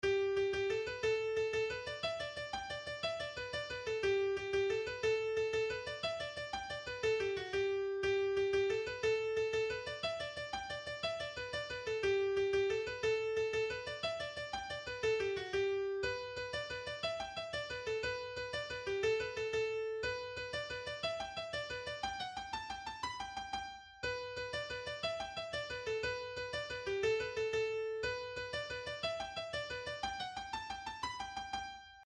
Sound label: kesh jig